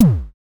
DSM2 Tom; MPC DSM-2

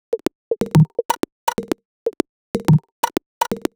Top 124bpm, House Drumloops; 90 s Sample CDs; Zero-G House Fabrik Drumloops; ZG Drumloops

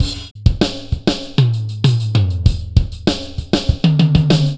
Drums; Sonic Foundry (Sony Creative Software) - Stylus Pressure Urban Grooves on Digital Wax

